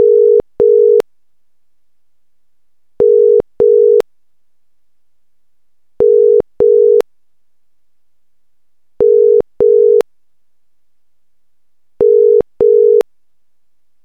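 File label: British Ringback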